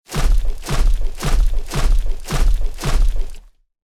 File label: Robot assault unit footsteps running